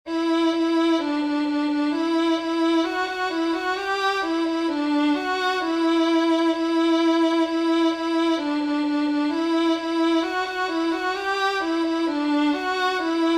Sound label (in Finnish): mettän polokuja